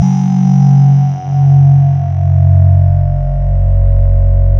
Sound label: SYN SCANNE, Microwave; Sound & Vision - Gigapack I CD 2